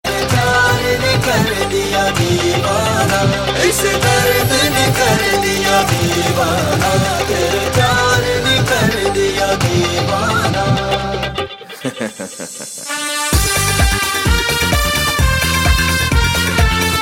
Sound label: Bell